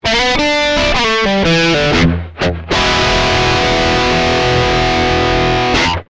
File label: smoke stack ii dirty middle coil